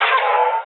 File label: SWEEP; Total Control - Action Cutz; LA WEAPON